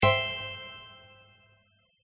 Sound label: Combo; gardenbloom